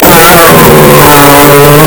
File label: ahhhhhhhhhhhhhhhhhhhhhhhhhh